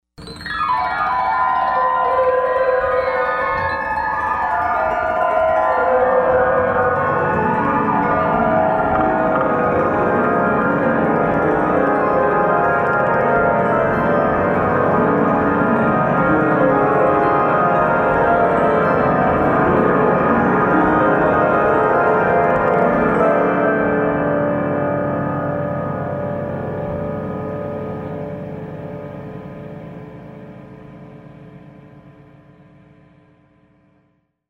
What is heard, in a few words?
Strumming, harp